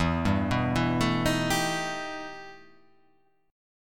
E Minor Major 11th E

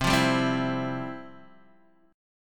F Major 7th Suspended 2nd, chord

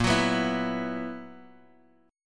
B Major 7th Suspended 4th Bb

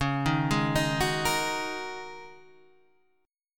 Ab 9th Suspended 4th Db

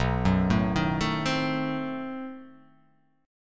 B 7th Flat 9th, down

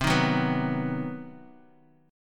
C Minor Major 7th Flat 5th chord, Bass Standard; strummed; JGuitar

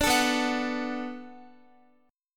B Minor 7th B Guitar, chord; Bm7 strummed

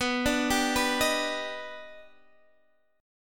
Eb Augmented Major 7th B

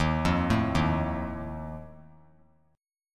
Eb Suspended 2nd Flat 5th Eb 3C 3F 3A 3D; Ebsus2b5 arpeggiated; JGuitar

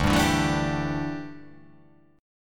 D Suspended 2nd Suspended 4th D down, chord; strummed

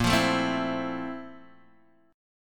F Major 7th Bb, chord; Fmaj7/Bb strummed; JGuitar

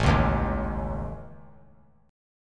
A Major Flat 5th A, chord; AMb5; strummed; JGuitar

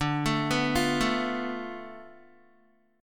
D Minor 6th Guitar; arpeggiated; 9,12,11,10,9,x; 4F,4A,5C,5G,5C,6E

